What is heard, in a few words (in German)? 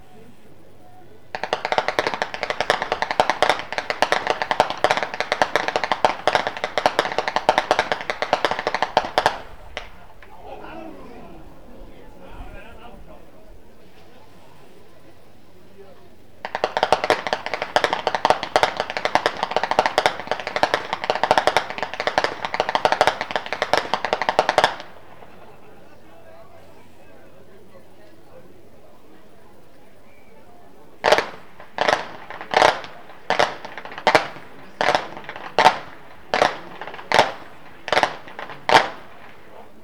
schnalzen, flachgau; anthering